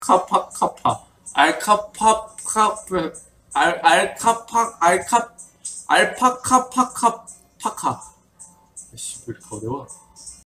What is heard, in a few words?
alpaca paka paka